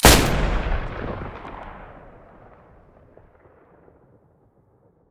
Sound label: muzzle protecta stereo, weapons